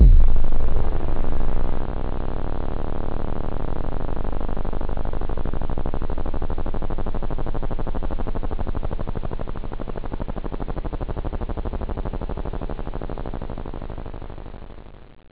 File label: long deep